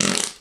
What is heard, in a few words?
FART; mahlendur